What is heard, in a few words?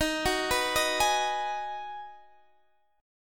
Ab Minor 7th